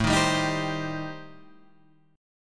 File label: A Minor 7th Flat 5th A down; Am7b5 strummed; JGuitar